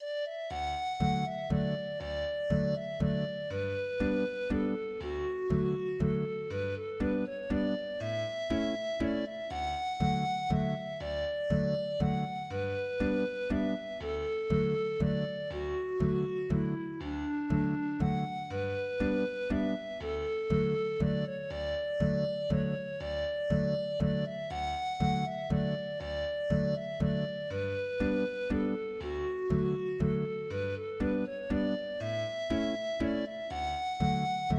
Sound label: Sheebeg Sheemore D Instrumental